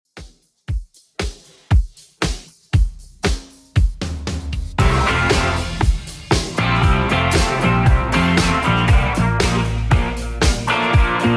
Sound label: 80s Redrum, 117 bpm